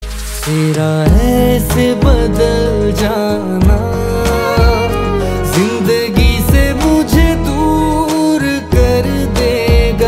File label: Ringtone